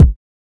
bassy kick drum sound b key

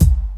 nineties rich high end kickdrum a sharp key

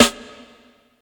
huge snare drum sound a sharp key

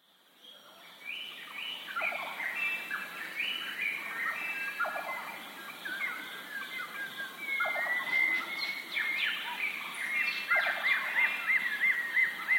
elephants - monkeys - deers and tiger; soundscapes

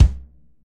solid kick drum one shot b key

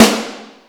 small reverb steel snare drum sound a key

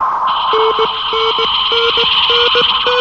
progressive bleep

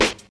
old school nice snare g sharp key

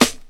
juicy steel snare drum sound a sharp key

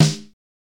old school tight steel snare drum sample D# key

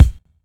smooth bottom end bass drum b key

